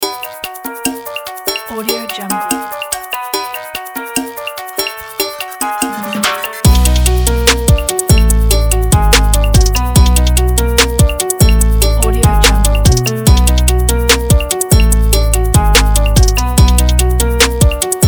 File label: latino salsa trap beat